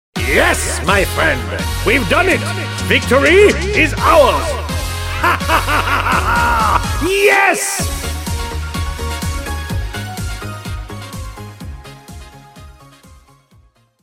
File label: victory; tf2; freak fortress 2; gamblerv2